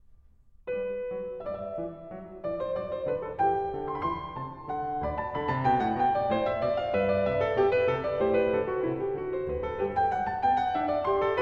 Bach Goldberg Variations BWV988 Variation 2 - Allegretto